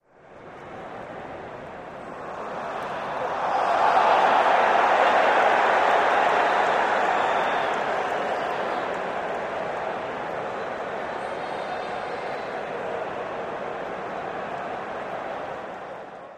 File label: Large crowd yells, applauds and whistles during a pro football game in an outdoor stadium.; Cheer, Crowd Crowds, Stadium Football, Crowd